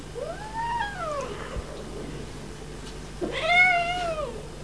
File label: Felis catus miaow